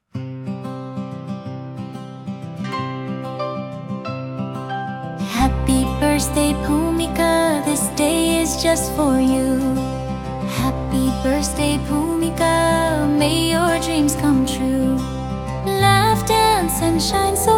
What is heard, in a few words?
Happy birthday Song